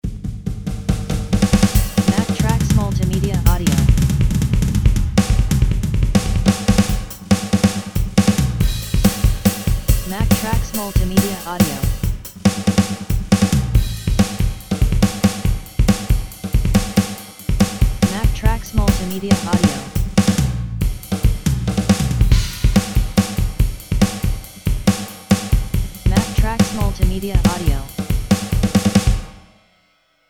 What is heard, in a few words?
drumkit snare buzz